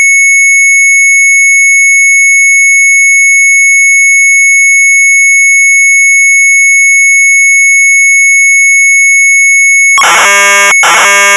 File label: MZ800 Tape, sharp; ZWEEFVLIEGEN; retromelsarchive